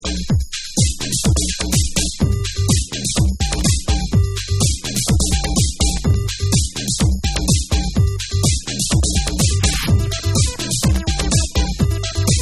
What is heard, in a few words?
MEN BEAT ON DRUMS